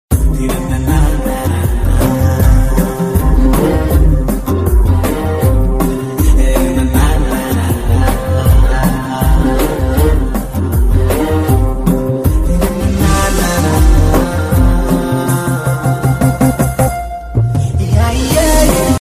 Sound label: huawei ringtone